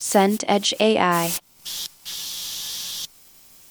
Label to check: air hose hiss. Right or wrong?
Right